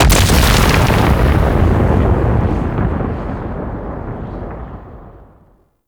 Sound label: Artillery Explosion, Corpus Arachnoid Camper Weapons; Warframe